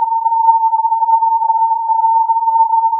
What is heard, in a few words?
filtered input